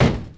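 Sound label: footstep car; UrbanChaos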